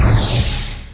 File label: baton hit; contractor